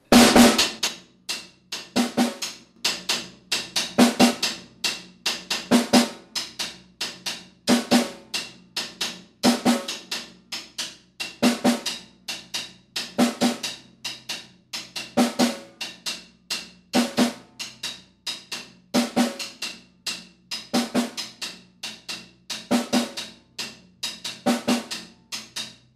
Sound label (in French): cascara 2 accents